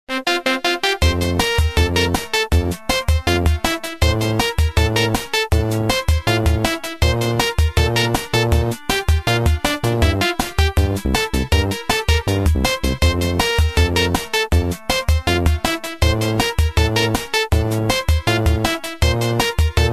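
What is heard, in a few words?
Huawei G2100, ringtone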